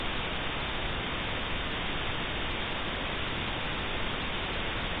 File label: thust; space game